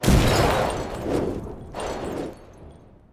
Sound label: fs All Collects Added; jack and beanstalk